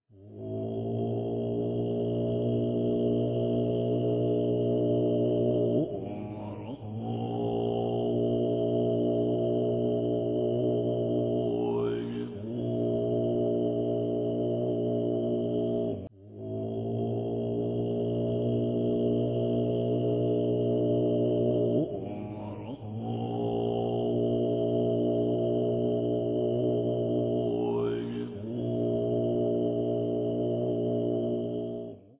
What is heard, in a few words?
Gyuto monks singing; Buddhist Monks Chanting OM